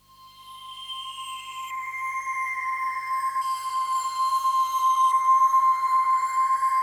RISE C5, trance; Best Service Advanced Media Trax Modern Composer

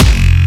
Jump Kicks, Bassdrums

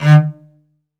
SOLO CEL, Strings; ILIO

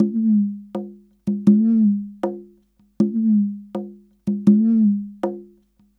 Slides 080bpm, African Drums, Conga Slides; Quazibeat; African Secret

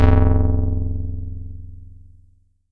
BASS SH09 F0, Synths, Roland SH-09, Bass

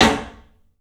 club snare, 3D Drums; 3D Sound

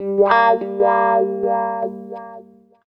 GTR; USB Soundscan vol.41 - Roots Funk; AKAI

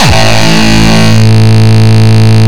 Longkick, kicks

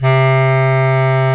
Bs Clarinet, Orchestral Winds; Roland LCDP04 Orchestral Winds; Lo Clarinets

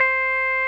Roxichord, E.Piano

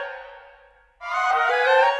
Winds, Orchestral; Sonic Foundry (Sony Creative Software); Orchestral VOL-2; Modern 16

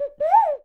bird call

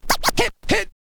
scratch kit, vinyl

